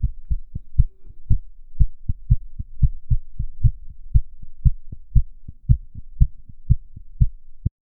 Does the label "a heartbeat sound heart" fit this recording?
Yes